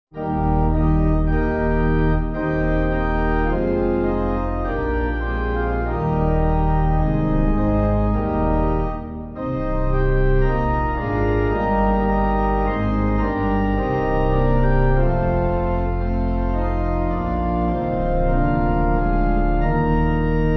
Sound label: Were You There, Pipe